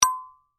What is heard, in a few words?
Chime Bell Muted, Music, Chime, Bell, Muted; Bells (Sound Effects:Musical)